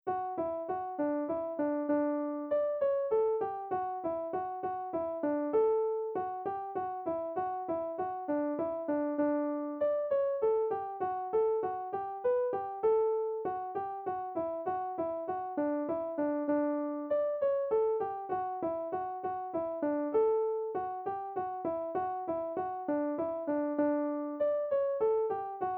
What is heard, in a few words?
slow; rolling waves